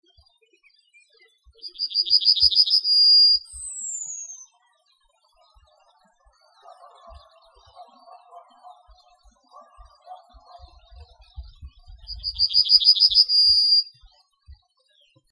trznadel śpiewający samiec

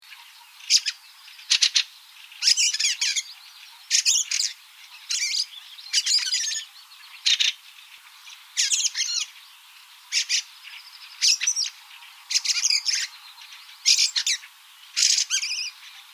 kwiczol spiew samca

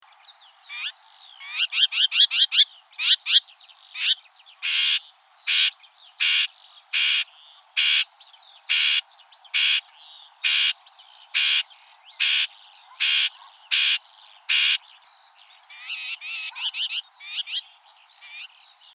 cierniowka glos zaniepokojenia

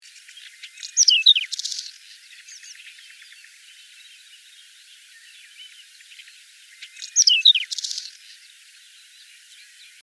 pokląskwa śpiew samca

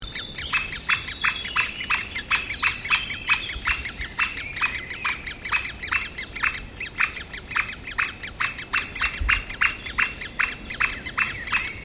dzieciol duzy glos zaniepokojenia i pisklat

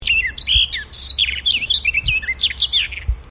jarzebatka spiewajacy samiec

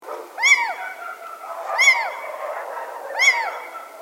pojdzka glos ostrzegawczy

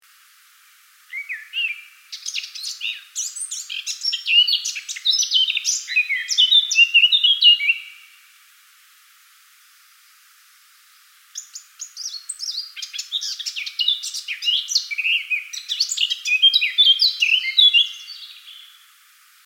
kapturka spiew samca

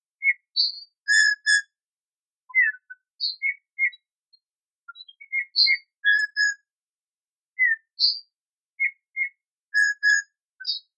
gil spiew samca

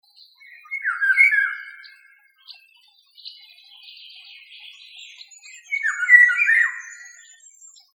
wilga spiewajacy samiec

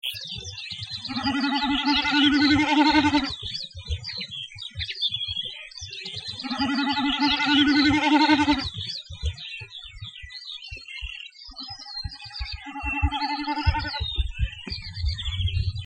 kszyk tokujący

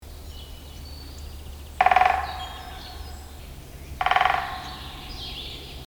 dzieciol duzy bebnienie

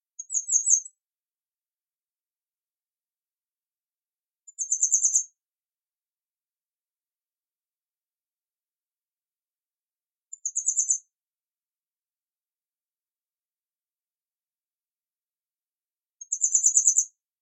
pliszka górska śpiew samca

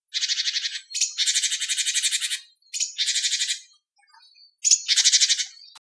bogatka glos zaniepokojenia

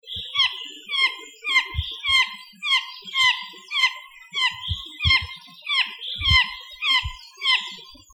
sojka nasladownictwo glosu jastrzebia

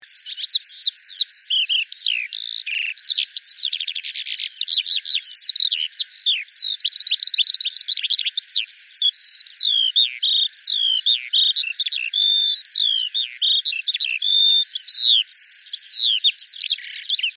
skowronek śpiew samca

